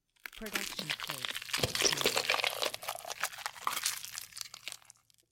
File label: body gore